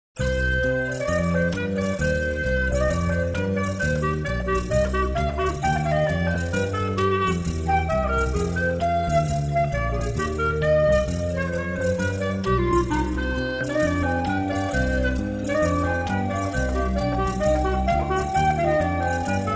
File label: trio